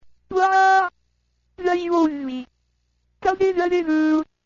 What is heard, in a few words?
Eat; Minerva